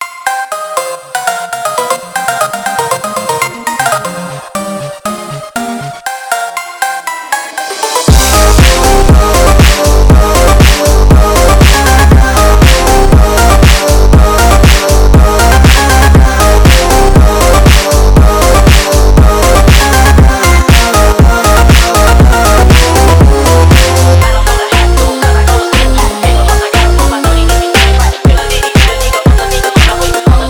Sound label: PHONK